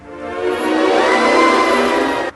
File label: beam in out; spawn npcs; HEDZReloaded; effects